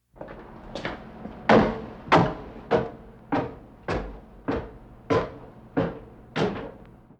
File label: Footsteps Door Opens; Inquisitor 3